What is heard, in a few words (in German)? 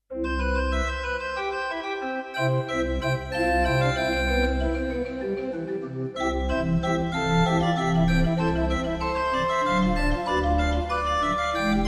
CAPELLA, Digitalorgeln; Bach Trio